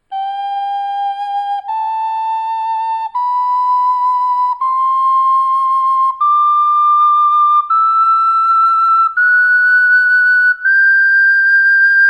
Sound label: duet, ocarina